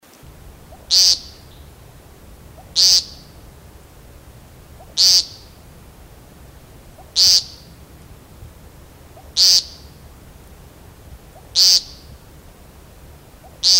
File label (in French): becasse d amerique, chant